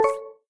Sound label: tick start; camera app ui; chromium